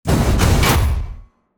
offense Into Defense

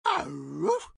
Dog question

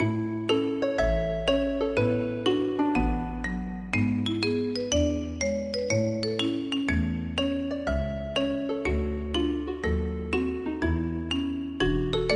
Underwater

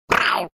Croc exclaim

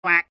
Duck short

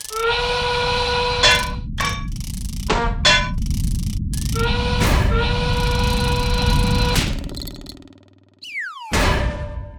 Boiler death

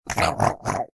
Croc med

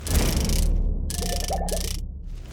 hit By Throw

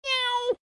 Cat exclaim

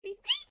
Rabbit question

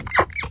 Mouse med

